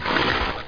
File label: horse